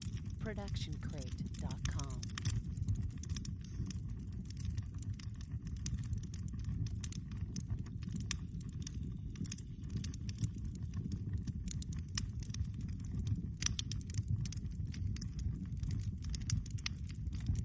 Indoor Fire; SoundsCrate

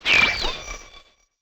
Quirky Laser; soundscrate

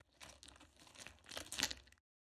squishy medium; soundscrate

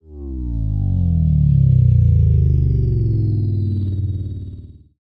Bass Drop; SoundsCrate

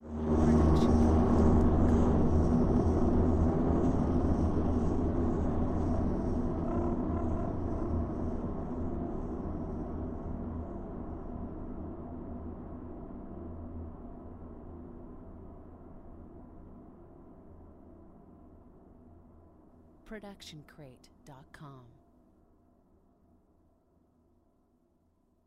Creepy Low Grumble; SoundsCrate

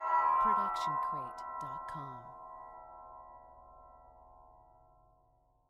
Eerie High Ring; SoundsCrate

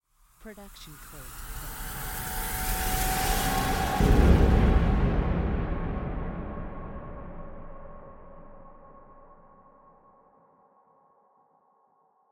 trailer hit riser ghostly; soundscrate

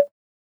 plop; soundscrate

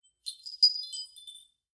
Short Wind Chimes; SoundsCrate

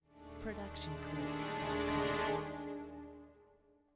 tense strings; soundscrate

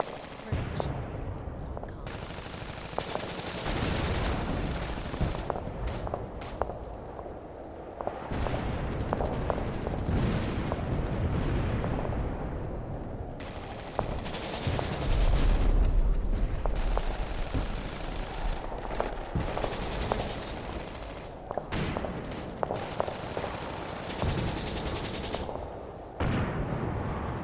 war ambience; soundscrate

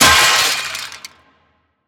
metal impact; soundscrate

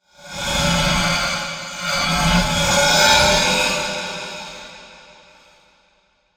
horror ghost whisper; soundscrate

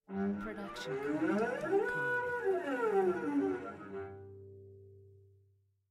Cello Slide Up Down; SoundsCrate

